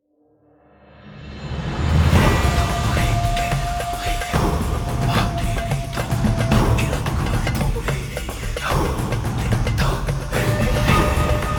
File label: Monster Hunting Combat; Skellige